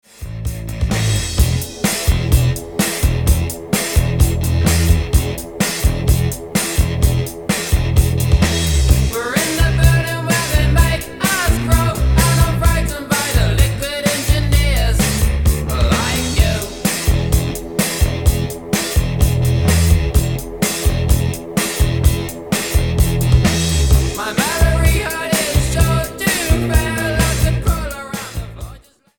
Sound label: metal